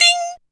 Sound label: HI DING; Back In Time Records; Korg Universe VOL-1; DRUM FAMILY